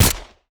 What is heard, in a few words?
groza silencer; STALZONE